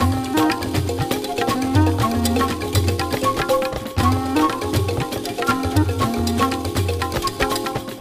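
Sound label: music world music action part d percussion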